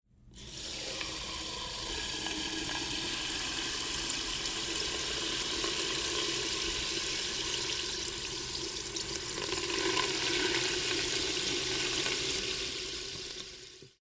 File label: soda